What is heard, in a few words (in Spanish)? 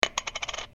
jugar ficha